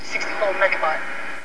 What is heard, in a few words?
time up